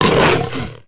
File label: tukata hit; Star Wars Knights Of The Old Republic I; XBOX